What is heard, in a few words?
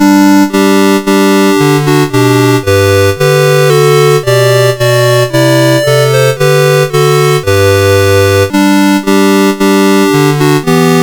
Hark the Herald Angels Sing, CSG8580R5